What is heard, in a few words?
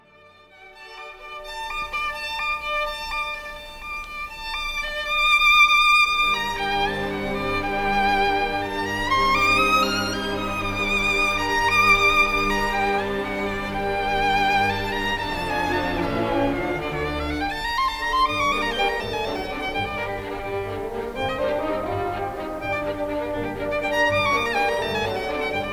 Beethoven Violin; NY; Bernstein; beethoven violin mvt 3